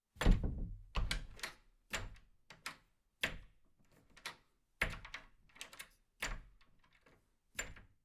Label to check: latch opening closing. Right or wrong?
Right